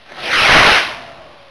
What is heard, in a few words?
rocket; twitch-1.0